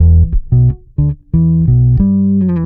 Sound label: bass dubby, Loops, Phonky